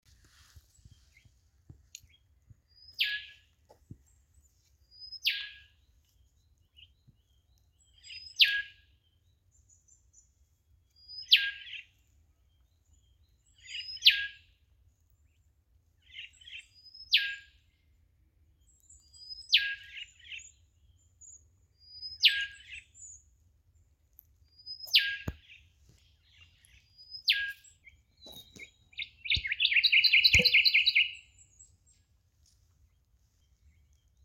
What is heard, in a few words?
Pachycephala Rufiventris, bird species; Rufous Whistler; Call 2